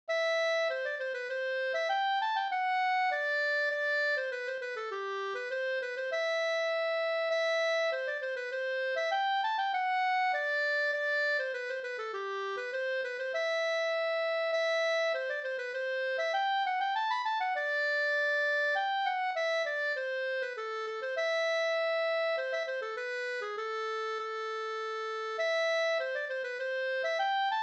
polska vispolska

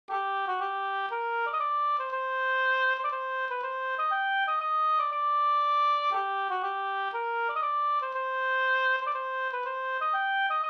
polska Moll

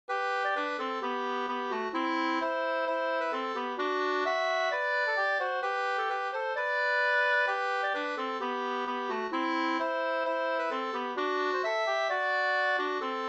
schottis; Skaftingeschottis 2st

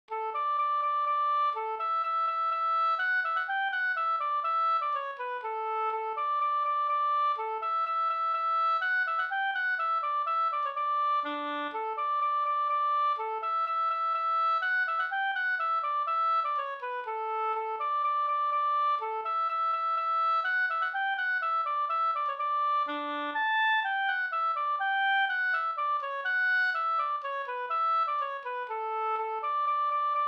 slangpolska

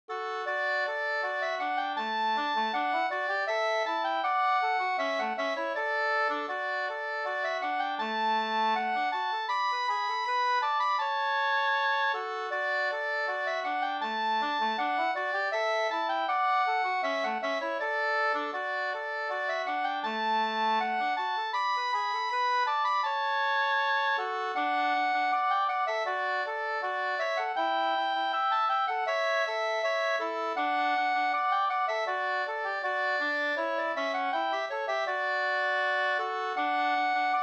ganglat Marsch

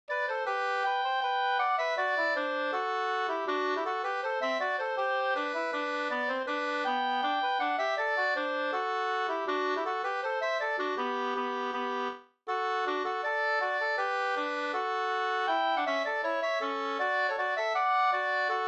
schottis 4-4; med andrastämma

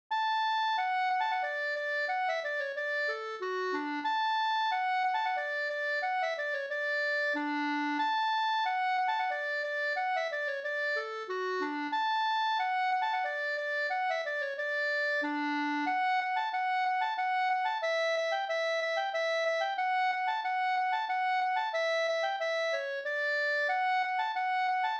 slangpolska; Torna Hallestad Sk

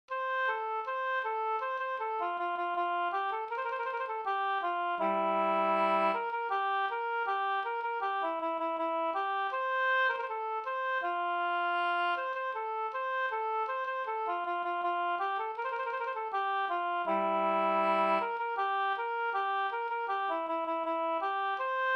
polka polkett; Talgoxen Up

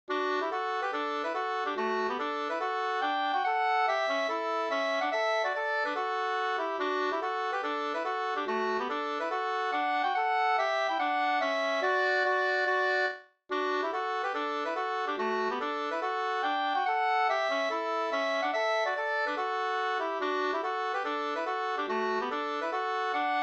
schottis; Min tos Flen035 2st

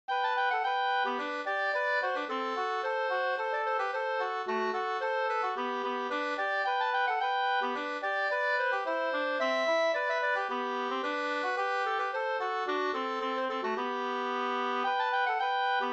polska; de Geers polska Up

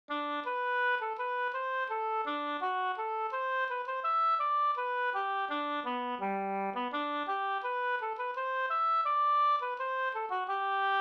skanklat

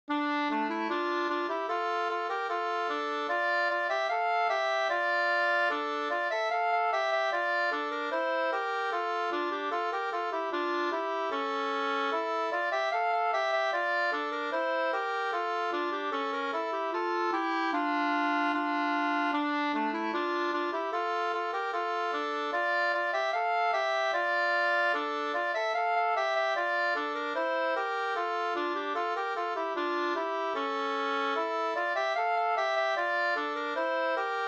schottis; Lanna-Villes schottis Nb 2st V1

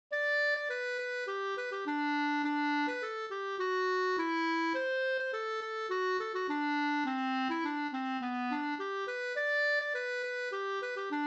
snoa; Orsa

